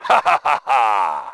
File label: cheer; svencoop; voice commands